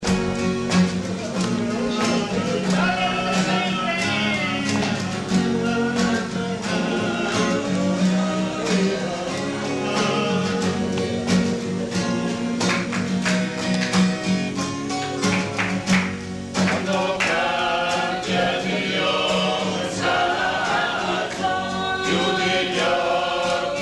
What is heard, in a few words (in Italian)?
coro